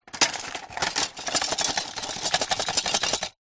plastic shards broken thin pieces shake in container; zapsplat